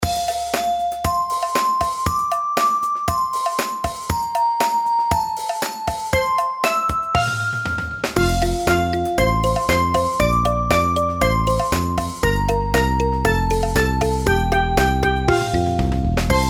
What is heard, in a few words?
nursery